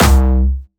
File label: C2, Jumpstyle Kick; Bassdrums; Jumpstyle Multis; vengeance essential club sounds-3; VEC3 Bassdrums